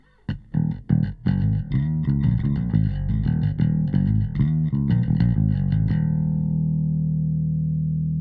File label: limiter Bass